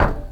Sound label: step metal, footsteps